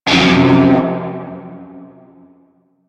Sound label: Shawzin Hirajoshi Chord F; Warframe; Narmer Shawzin